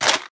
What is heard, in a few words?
item wood; RPG